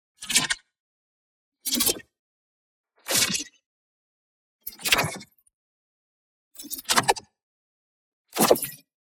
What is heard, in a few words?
WHOOSH SMALL Digitized Projectile; boom library; Matter